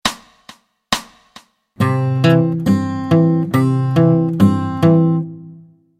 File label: Travis Picking